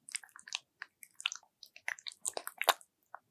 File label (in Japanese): 抜く; ASMR